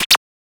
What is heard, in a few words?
Glitch, One Shots, Glitch FX